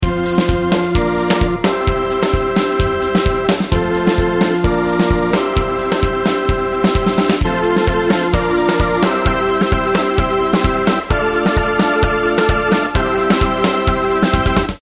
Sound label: Flanging with Drums; waves